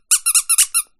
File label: squeak